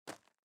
SFX run gravel; gamerip; Out of Reach: Treasure Royale

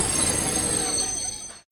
Train Stop; pulling no punches

